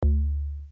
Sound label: player join